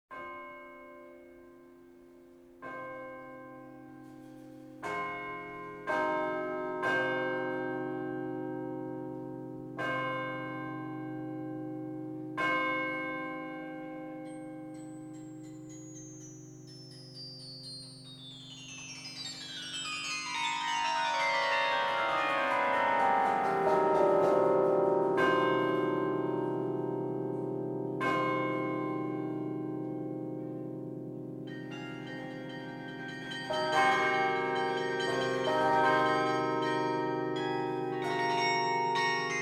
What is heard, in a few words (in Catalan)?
campana